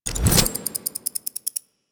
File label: Anchor End Warning; Warframe; Protea